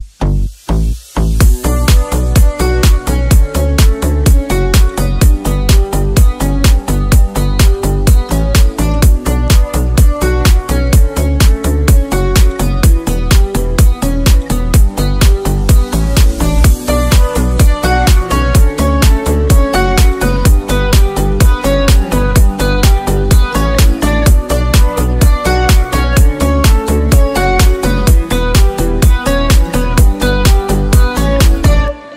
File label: Instrumental